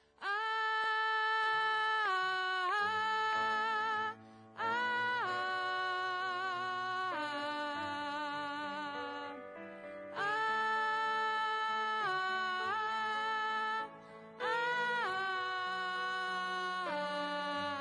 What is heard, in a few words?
tenor